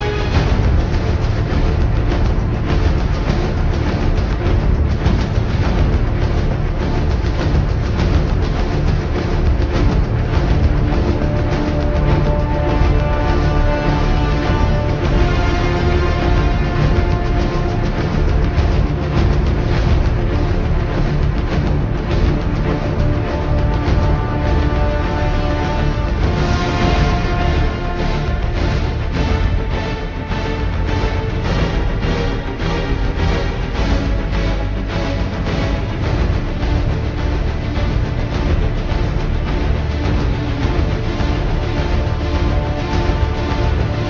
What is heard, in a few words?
music nemesis; zombie plague